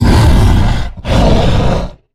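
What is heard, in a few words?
Stealth Attack; Evolve; Goliath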